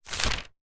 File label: flip